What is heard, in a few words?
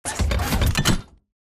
combat walk to idle forward; Titanfall 2